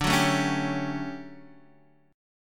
D Major 11th D down, chord; strummed; JGuitar

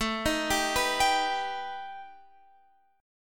B Augmented 7th A

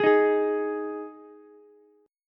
G sharp 5th G sharp, down, Ukulele; strummed; JGuitar

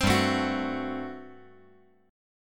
G Major 7th Suspended 2nd Suspended 4th G down

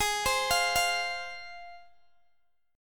G sharp 6th G sharp down Guitar Open G, chord

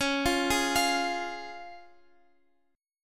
C sharp Major, Guitar Open F; arpeggiated; JGuitar

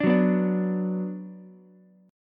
F sharp Suspended 4th Sharp 5th, Ukulele; strummed; 7,6,7,5; down

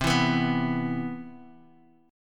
C Major 7th, Bass Standard; CM7 strummed

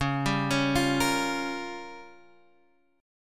C sharp 6th Flat 5th, Guitar, Drop D; down; chord shape

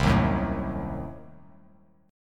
C-Diminished 7th, Bass Standard, chord; strummed; JGuitar

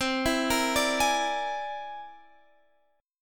C Augmented 9th, Guitar, Standard; arpeggiated; JGuitar

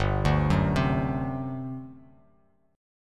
G Major 7th Suspended 4th G down; arpeggiated

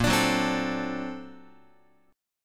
A sharp Augmented Major 9th Guitar; strummed; JGuitar

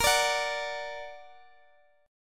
A sharp Augmented, Guitar, Drop D; x,x,x,15,15,14

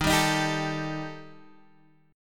D sharp Major Flat 5th, Guitar, Half Step Down; D#Mb5

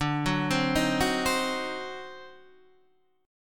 D 7th Flat 9th D down Guitar Open D

